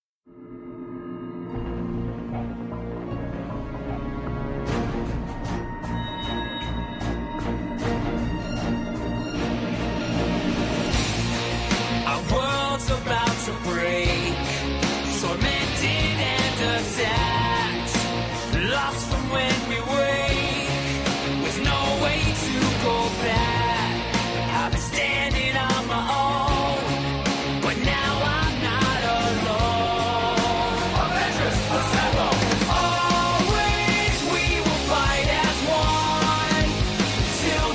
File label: theme